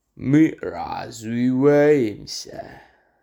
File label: evolution sound